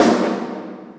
jilted, snares real

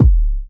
oort, Kicks Electro

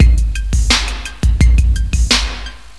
Drum loops, Electro

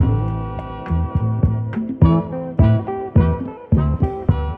horns, phonky loops

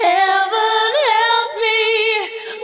"heaven help me" sung; Voice; expletives

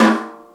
snare, Real

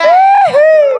hoo hoo; Voice; ooh ahh

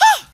ah, Voice ooh ahh

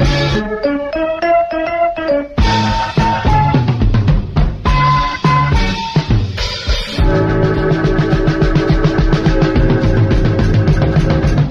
full, Loops Phonky

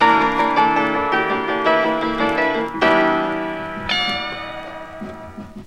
piano, Phonky loops

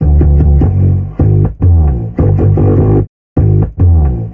bass, Loops, Phonky

